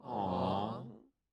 audience impressed ahhh

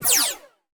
scifi weapon laser

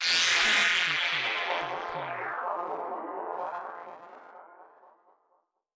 anime electric laser

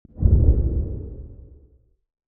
big sword woosh

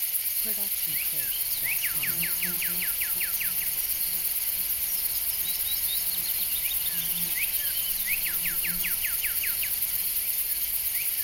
forest ambient; soundscrate

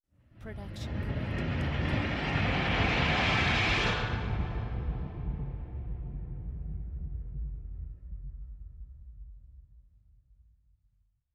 Industrial Rise; soundscrate

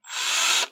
drill fast short; SoundsCrate

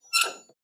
Squeaking; soundscrate

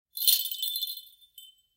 Short Wind Chimes; SoundsCrate

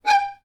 Violin Screech Noise Short; soundscrate

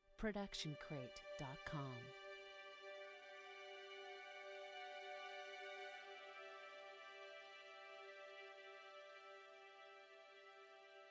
feel good high strings phrase; soundscrate

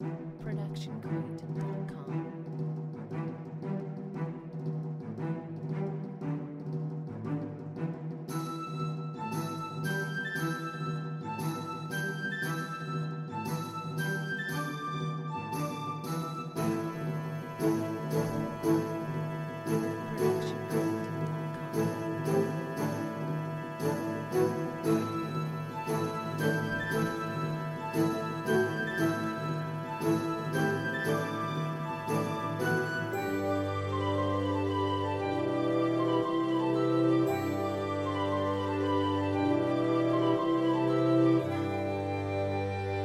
faire dance; soundscrate

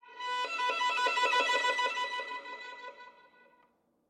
Violin Octave Flutters

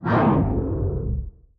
Fire Woosh; soundscrate

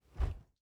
Fighting Woosh; SoundsCrate

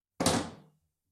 Metal Footfall; soundscrate

